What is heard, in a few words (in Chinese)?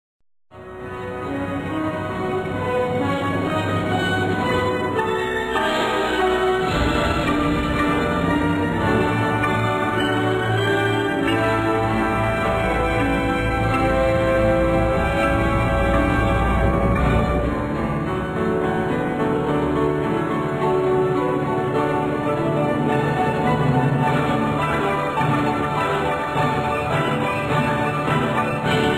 片头音乐